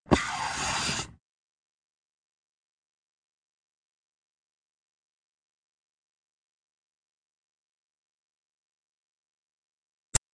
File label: Track, Doors; 헐리웃특수효과음; 문 소리